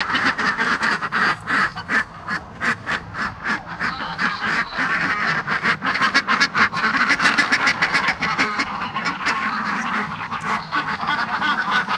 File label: ducks and geese quacking and honking; birds